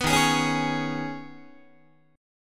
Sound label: D sharp 7th Suspended 4th Sharp 5th, Guitar; D#7sus4#5 strummed; JGuitar